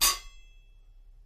metal hit

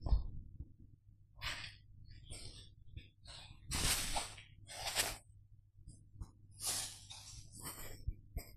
waving umbrella; operation

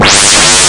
laser gun; operation

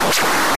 damage

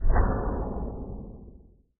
quake

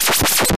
electronic shocks

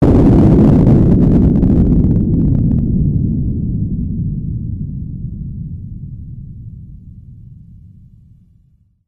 blast

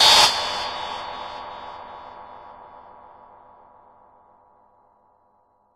shock; animal

